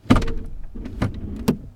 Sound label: Gear Shifting